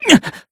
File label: damage; dr2; hajime